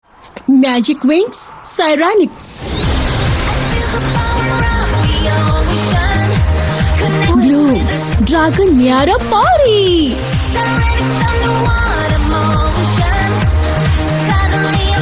Instrumental; Winx Club 8; Macedonian-Македонски

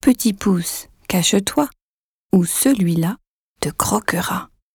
Cello and Orchestra; LGT Young Soloists; Petite Ballade, Op 12, No. 1

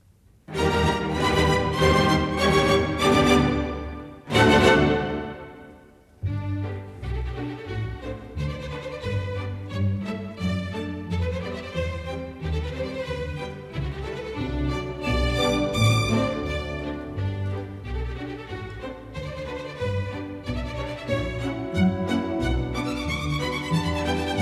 Philharmonic Orchestra, Ballet Music; Gounod Faust, Act 5 Ballet Music II. Adagio

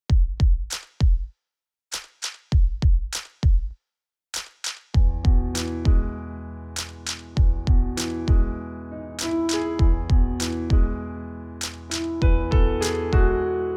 Pianos and Orchestra

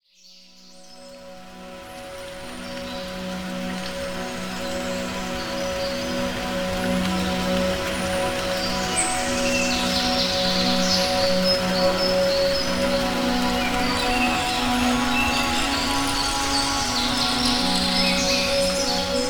Regen, Nature Sounds, Tropical Forest

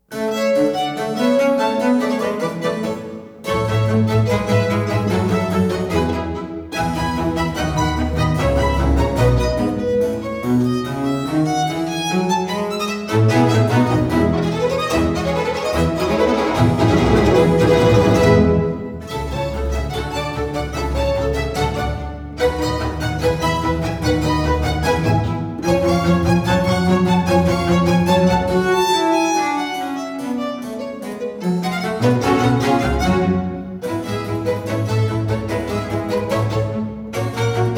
Concerto grosso in B-Flat Major; Orchestre de chambre de Toulouse, Gilles Colliard; Op. 1 No.3

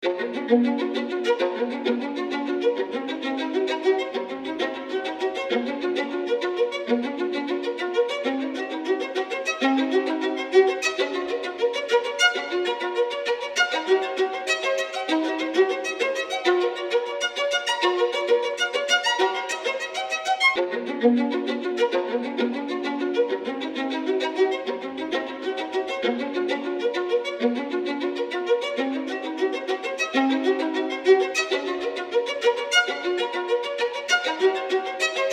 Classical Violin

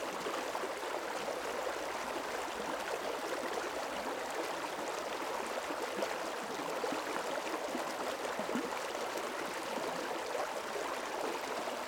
Chillout Piano Lounge

